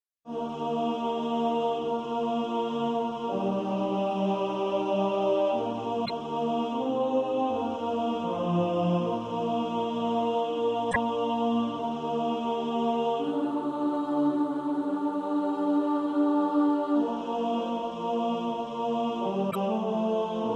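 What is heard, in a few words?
tenor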